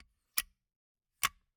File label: Gumball machine, candy door, plastic, open, shut, wide; Eiravaein Works; Vaeyan IV